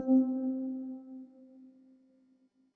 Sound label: swap